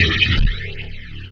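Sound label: HH, hi-hat; Sonic Foundry (Sony Creative Software); Esoterik Beatz; Quesy Kit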